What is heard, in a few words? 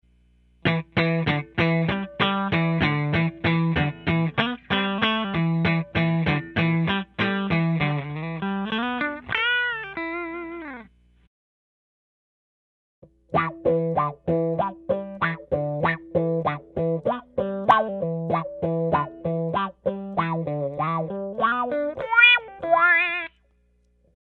WAH VINTAGE